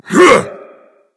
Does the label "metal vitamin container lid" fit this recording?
No